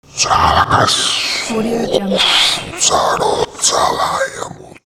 demon

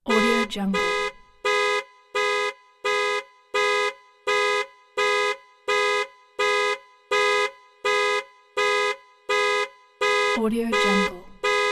car horn alarm

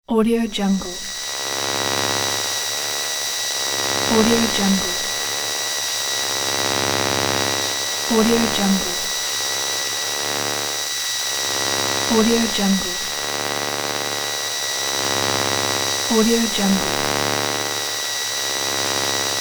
ufo electric distortions

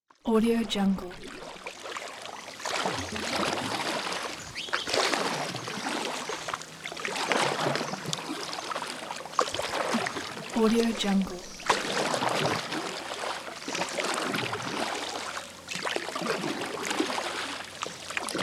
forest lake

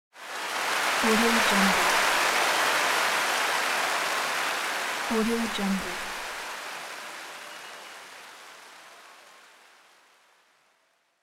applause crowd

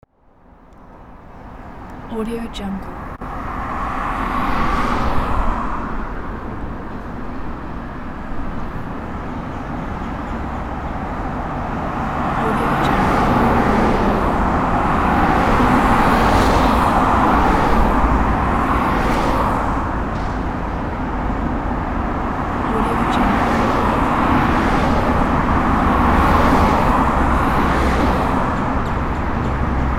highway

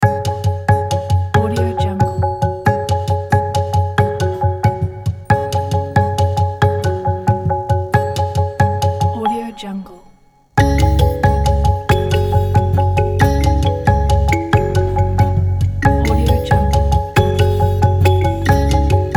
documentary background

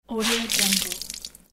wringing wet cloth; vintervarg

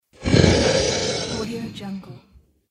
dinosaur roar; studio j

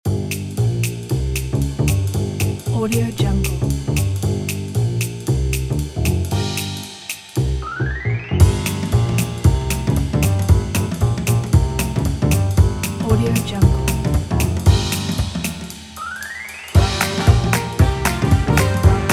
jazz

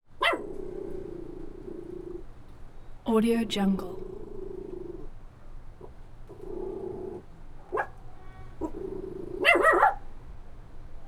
puppy small; prosoundfx

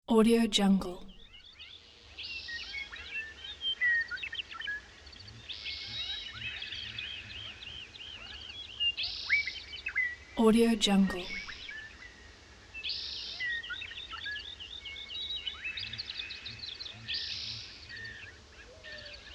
mountain birds

